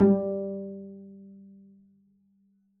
pizz g3, double bass

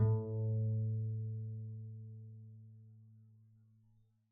pizz a2, double bass

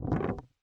noise muted strum, swag bass

